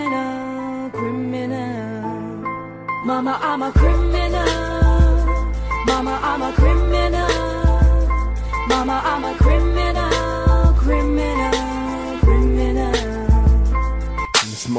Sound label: hip hop